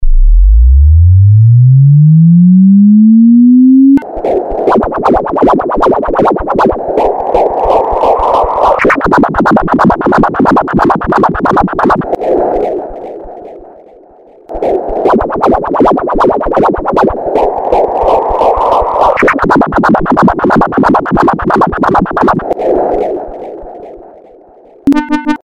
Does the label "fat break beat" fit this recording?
No